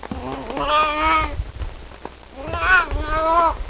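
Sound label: cloud leopard voice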